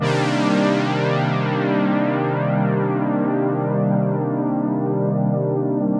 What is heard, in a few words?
JX, synths; OP-Z; Fifth One